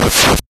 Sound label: Missile; NES; Metroid